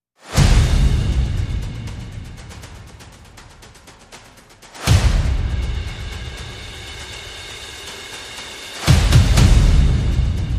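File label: Percussion and FX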